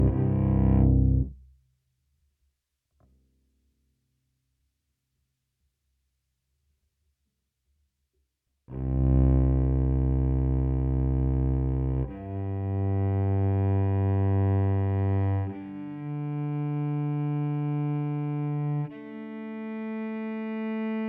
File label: pickup direct